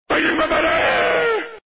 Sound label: warcry; raiden; anime